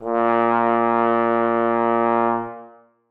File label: bass trombone a#2; Sonatina Symphonic Orchestra